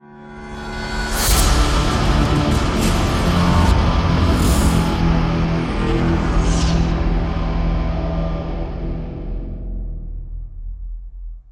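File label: Sting; Become Grimlock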